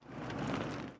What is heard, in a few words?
catapult walk; propack